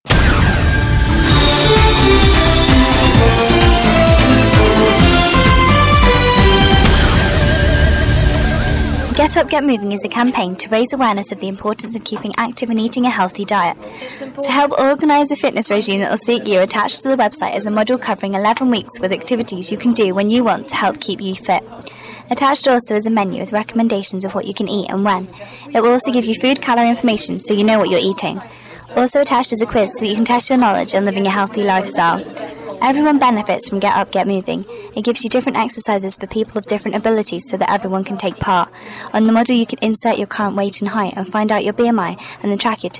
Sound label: podcast music; audacity